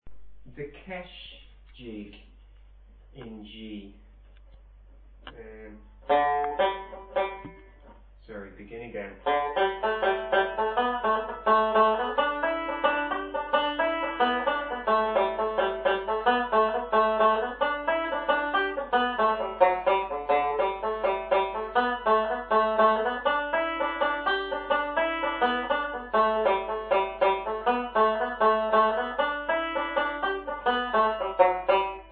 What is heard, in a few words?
Jig; The kesh jig